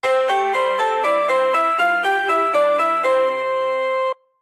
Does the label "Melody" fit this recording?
Yes